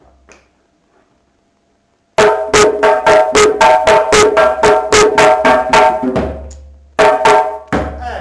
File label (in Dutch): SOLI Break Var Traag